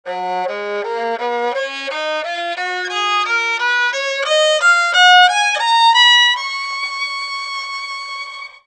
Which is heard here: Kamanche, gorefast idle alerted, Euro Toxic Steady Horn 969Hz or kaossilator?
Kamanche